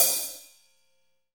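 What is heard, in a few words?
HH, hi-hat; Sound & Vision - Gigapack I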